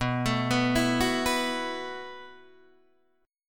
B 7th Suspended 4th B down Guitar Open D

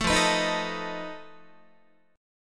F 7th Flat 5th F down Guitar; F7b5; strummed; 12,13,14,13,13,14

